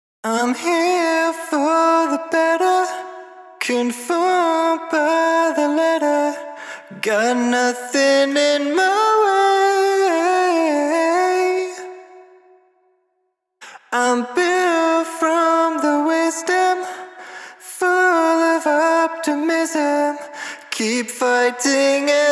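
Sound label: EDM 140 B minor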